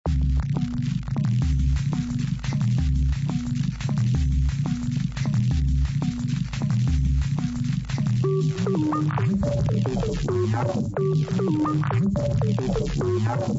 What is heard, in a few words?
fat open